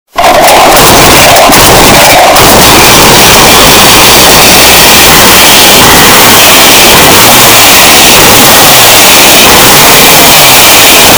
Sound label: chainsaw; yanex